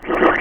Slurp Back

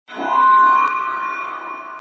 monster howl

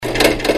Lock

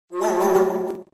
Wow Wow

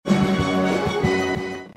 glory fanfare

